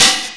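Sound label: 909 open hi-hat; jazz jackrabbit 2; M8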